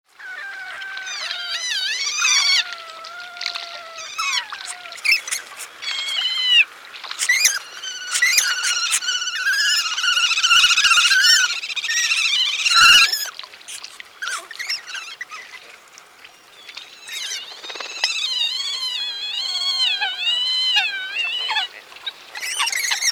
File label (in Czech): vydra říční mláďata v boji o potravu; parazoo všemi smysly zvuky vydry říční